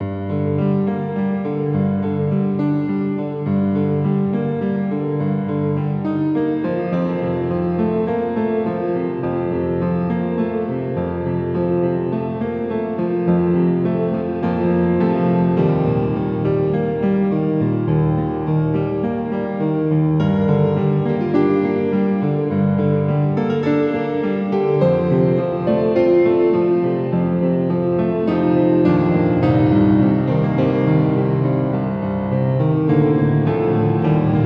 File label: Piano